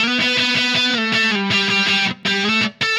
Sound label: Happy Pub Guitar